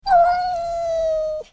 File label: Cat Meow